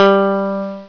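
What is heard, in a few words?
Bass Guitar Low; Instrmnt; Sound Library 2000; Wayzata Technology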